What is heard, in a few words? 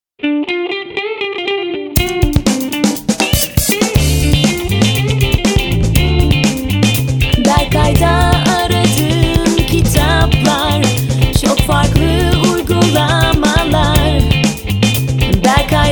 jingle